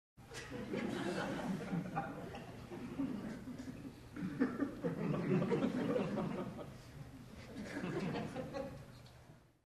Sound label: Crowd Minimal Laugh